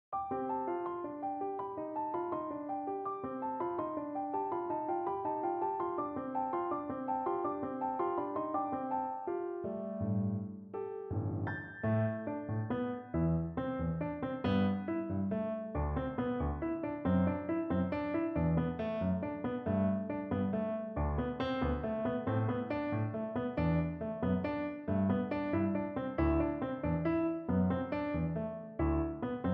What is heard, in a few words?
Bela Bartok piano excerpt